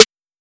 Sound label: Snare